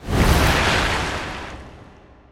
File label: wave attack; zoochosis; whale